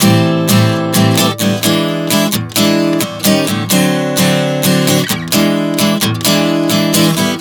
Am7-E7-D7-E7 130 bpm, acoustic guitar chord progressions